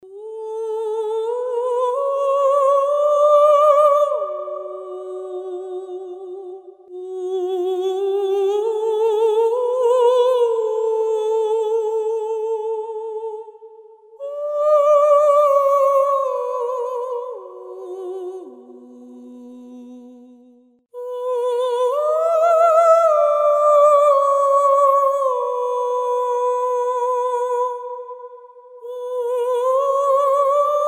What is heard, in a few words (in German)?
Lyrical Vocal Phrases Sopran